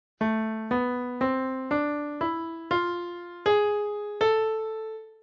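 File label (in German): Tonleiter a Harmonisch Leitton; die moll-tonarten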